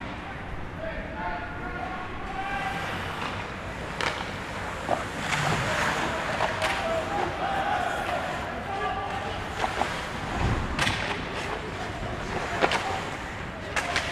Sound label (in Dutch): Icehockey Effecten Goed